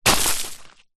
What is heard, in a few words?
stones granite gravel and dust kick foot disperse roll; foley; zapsplat